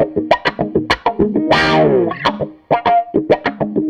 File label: Tele Guitar Loop; Sonic Foundry; Six-String Orchestra